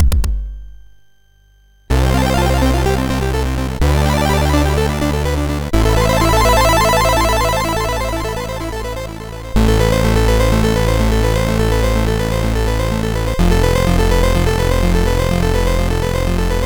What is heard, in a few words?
tune, MOS6581R3